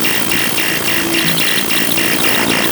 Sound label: drone caught